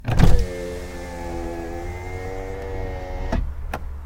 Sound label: power window, car